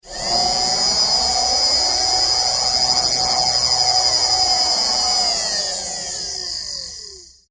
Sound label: Science Fiction Servo Motor; Spacecrafts